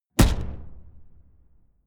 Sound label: sci-fi shot rustle